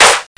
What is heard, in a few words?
EXPLOSIO; Spanish Net; ATTIC